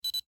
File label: scan; Mpaas-Scan; ios; TBScanSDK